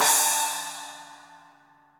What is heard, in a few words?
Cymbal A Finger; Latin Percussion; Drums; Drum Hits; Ableton; Factory Packs